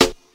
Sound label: raw snare sound A key